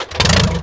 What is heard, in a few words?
spin; pcrazy; Bovine